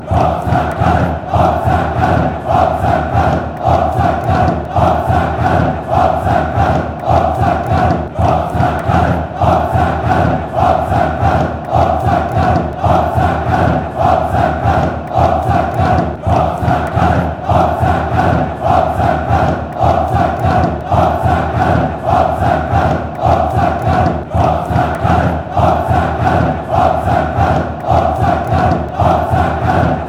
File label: forza, fan chants; gallen